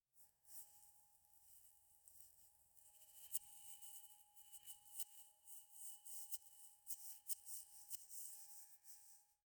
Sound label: foley